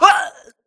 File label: grunt death neck; Titanfall 2